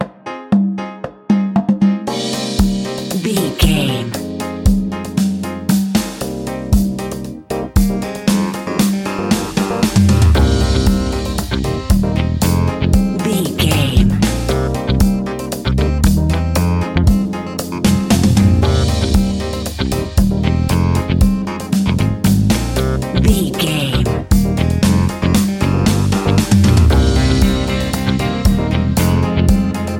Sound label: reggae; Hot Reggae Sauce for your next carribean dinner!